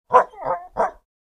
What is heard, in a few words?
Coyote Barks. Three Short Whiny Barks. Medium Perspective.